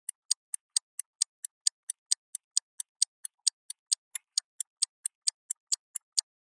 to wait

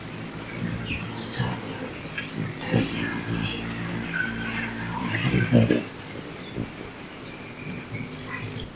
Festive coquette

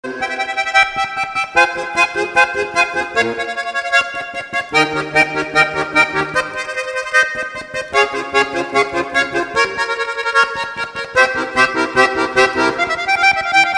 Accordion; آکاردئون

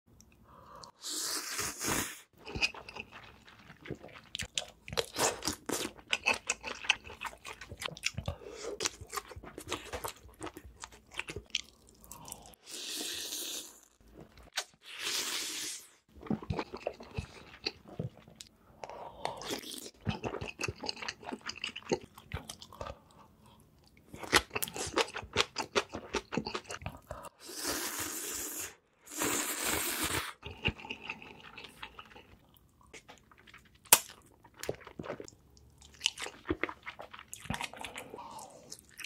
eat soup

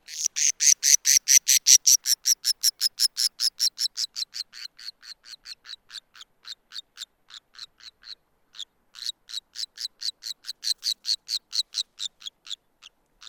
White bellied cinclodes; پرنده آب جوی شکم سفید

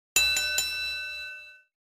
Boxing ring bell

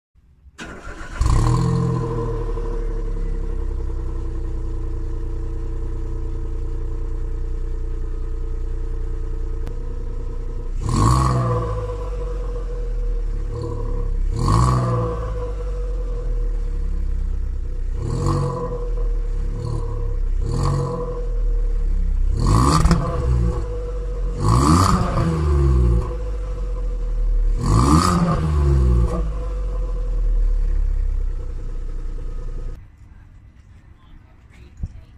Datsun GO; ماشین

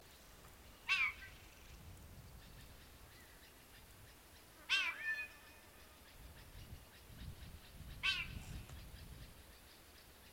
Neumann's starling

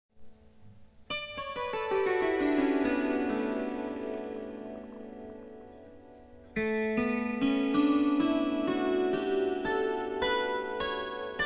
Kantele; کانتله

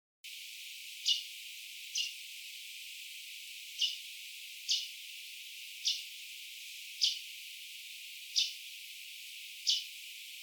Red tanager

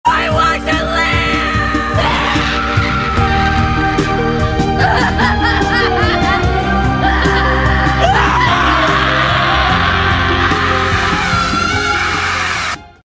Anime screams; فریاد های انیمه ای

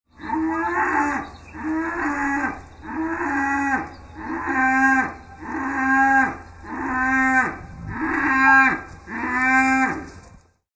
cow sound

